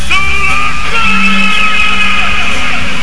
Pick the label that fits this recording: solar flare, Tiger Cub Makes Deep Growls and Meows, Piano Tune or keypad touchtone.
solar flare